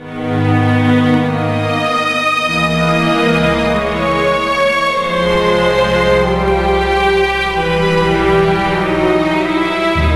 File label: Mission Fail; game rip; Legend of the Guardians The Owls of Ga'Hoole; nds